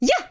yah wah hoo; birdo; SM64 CO-OP

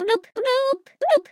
Drown; SM64 CO-OP; Pack; Miku

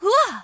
punch wah; pauline; SM64 CO-OP

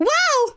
"whoa"; birdo; SM64 CO-OP

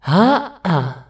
"eeuh"; rosalina; SM64 CO-OP

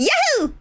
yahoo; birdo; SM64 CO-OP

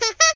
haha; yoshi; SM64 CO-OP

